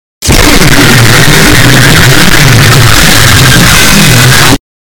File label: jumpscare; v-27; rooms